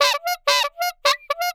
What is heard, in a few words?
clown horn short, Cartoon Film Effects